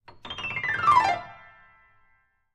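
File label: Grand Piano Short Descending; keyboards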